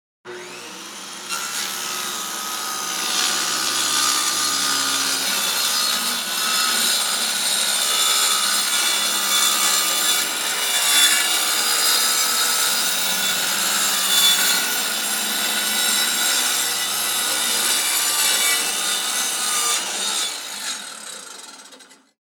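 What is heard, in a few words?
circular saw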